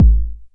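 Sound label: 909 BD tape, kick drum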